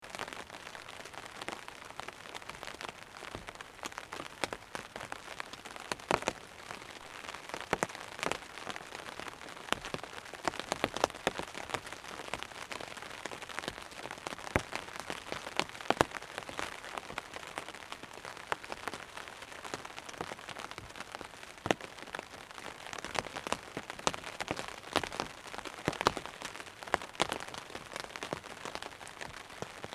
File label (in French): Pluie Sous Tente